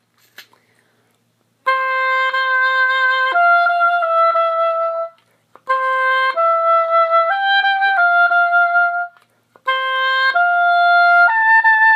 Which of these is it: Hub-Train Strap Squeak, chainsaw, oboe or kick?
oboe